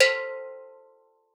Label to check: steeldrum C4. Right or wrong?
Right